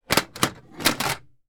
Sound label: metal